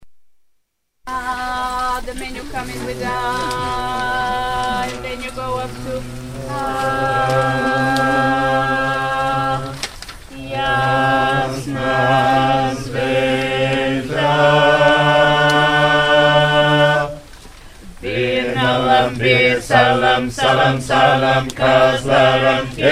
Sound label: bulgarian bass